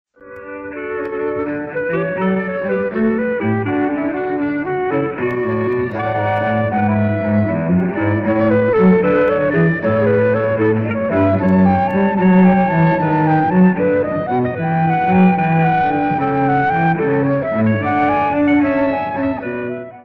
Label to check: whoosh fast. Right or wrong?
Wrong